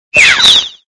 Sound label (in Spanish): Grito de Nickit; Pokémon; Gritos de Pokémon de la octava generación